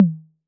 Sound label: Tom Mid C 808; Clean; Digital